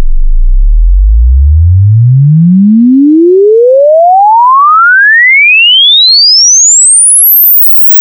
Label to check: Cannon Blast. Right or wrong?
Wrong